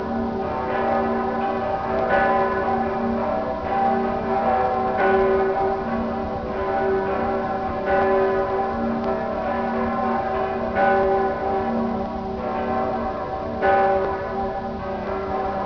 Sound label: Dom st korbinian, Kirchen; Freising